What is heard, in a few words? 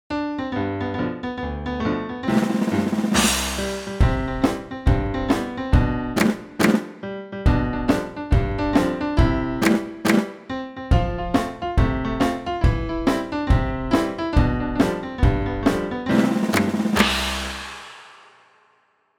music